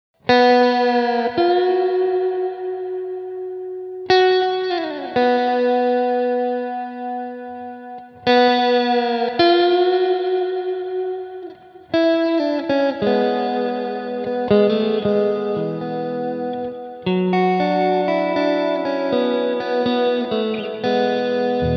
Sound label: magneto; BigSky